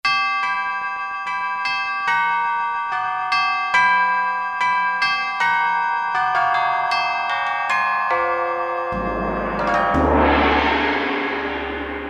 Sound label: GONG